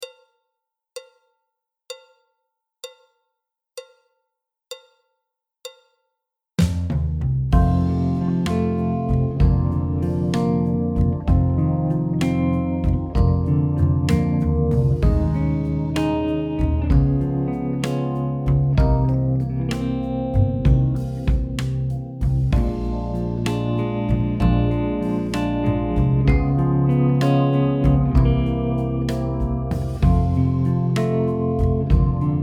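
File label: backing track, Jazz; alto, trumpet, trombone; Jazz Syllabus Level 2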